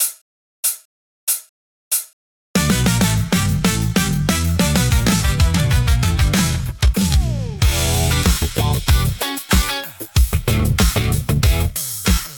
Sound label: vibe slow tempo count in